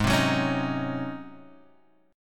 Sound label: Ab 11th Ab Guitar Open F; strummed; JGuitar